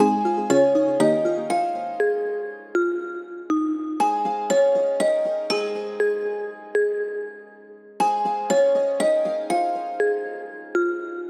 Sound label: system ringtones; Amazon; Fire-Phone